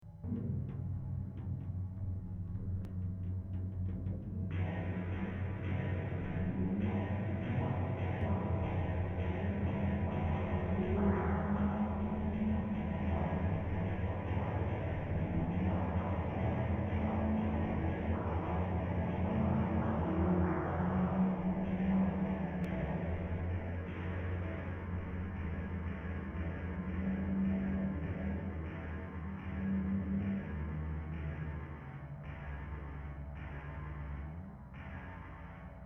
Horror

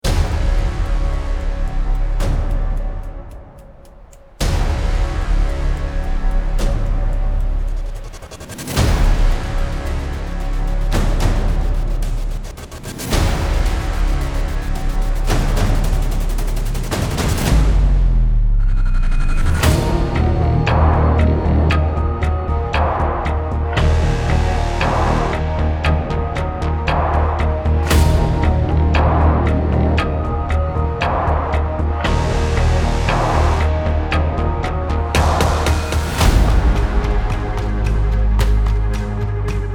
Minimal Dark Trailers

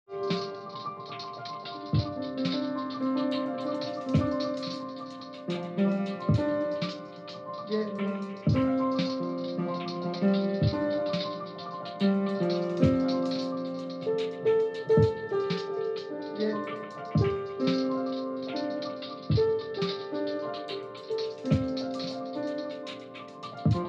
piano beat

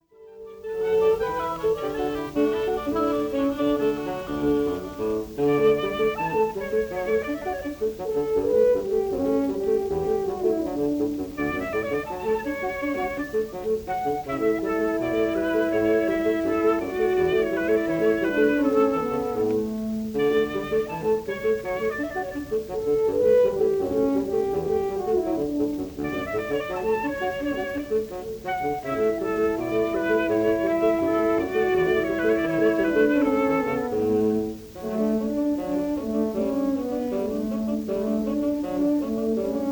Instrumental Ensemble; Pulcinella; Stravinsky

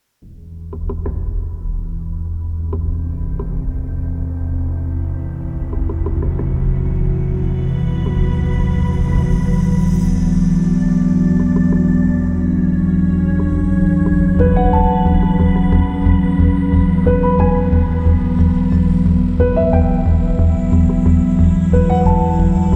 Trumpets; LANDR

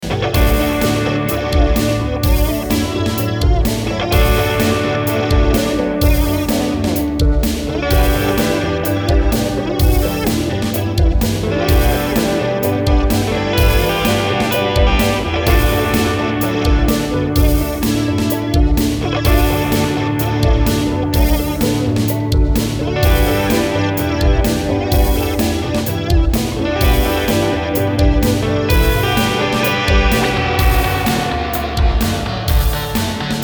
PROCESSED KIT

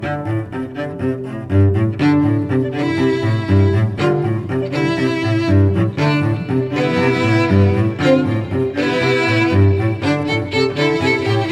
String Trio

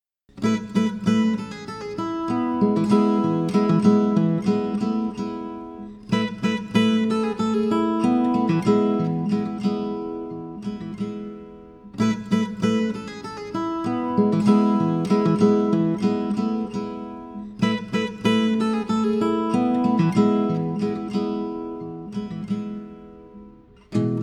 Acoustic Sketches

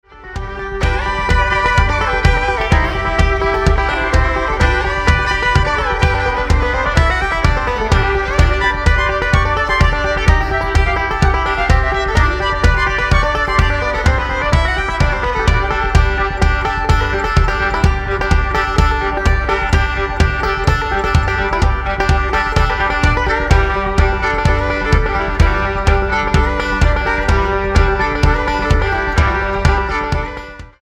Bluegrass Instrumental

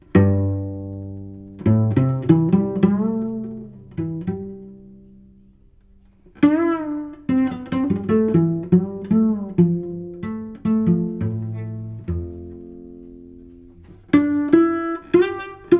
solo cello; solo cello one

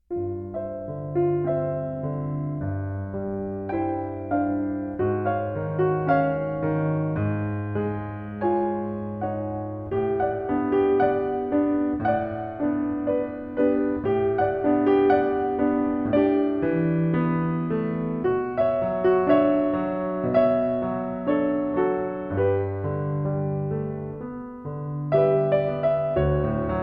A Major Seventh Up

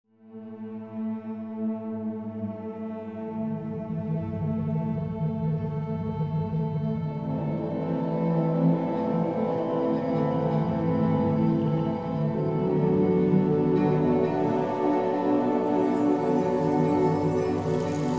circular pads

